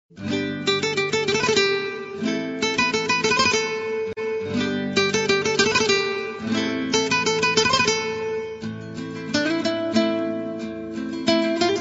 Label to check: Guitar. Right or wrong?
Right